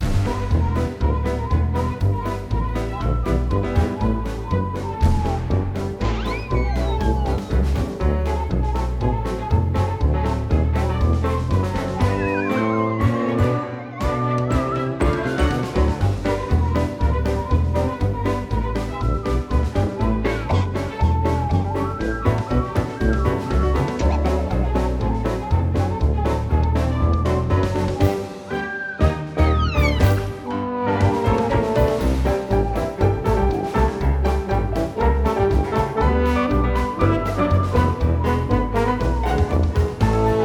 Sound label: Bloopers Ambient